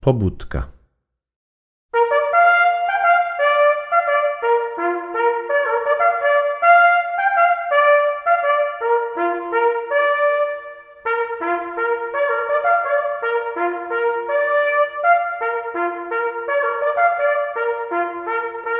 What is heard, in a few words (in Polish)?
pobudka solo